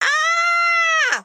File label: Scream